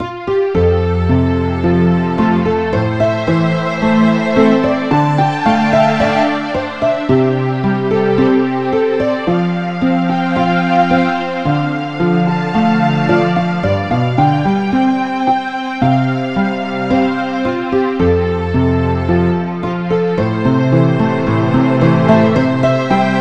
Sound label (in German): Orchester Pauke laut; cubase